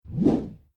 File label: swoosh quick low